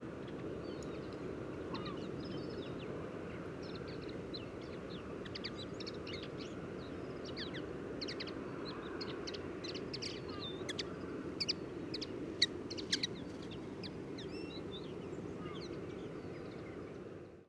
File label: Common Linnet Flight Calls And Song Fragments Of Two Migrants